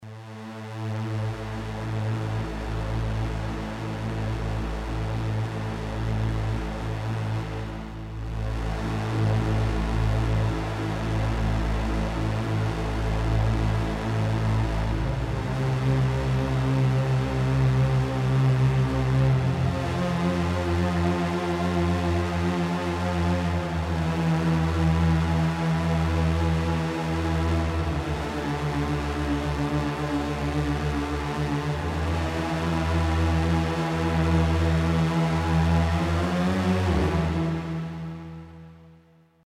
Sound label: pad, Riff intro by Yamaha Sy55; Rambo II - Ocean (1985); Retrogames music Synth remake